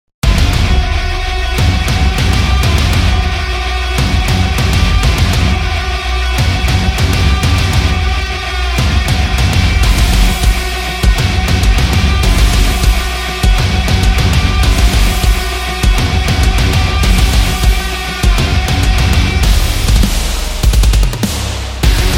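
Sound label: Army, epic cinematic trailer music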